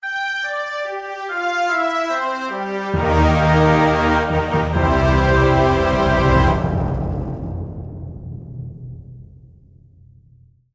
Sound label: Jingle End Level